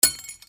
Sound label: BREAKING